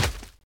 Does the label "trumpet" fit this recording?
No